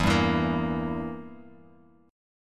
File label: E Diminished down Guitar Open G